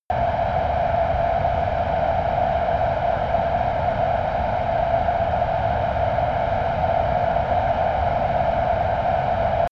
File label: military jet flanker-c turbine steady distant